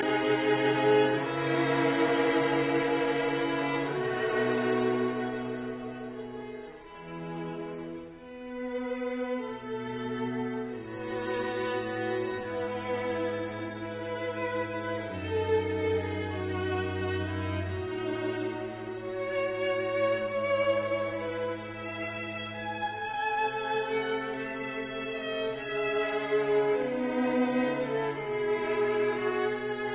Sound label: String Quartet in E minor, Molto adagio; Beethoven; Op 59 No 2; Rasumovsky